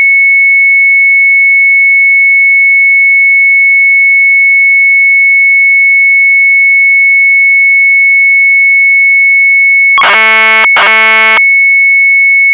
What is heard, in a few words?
MZ800 Tape; CHAR.PROG; sharp; retromelsarchive